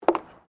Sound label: FOOTSTEPS WOMENS HIGH HEELS BOARDWALK STEP; Footstep Foley; Footsteps (Sound Effects:Human)